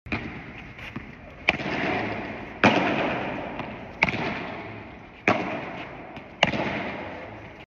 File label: Padel; ورزش پدل